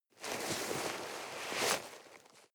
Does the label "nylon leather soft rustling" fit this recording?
Yes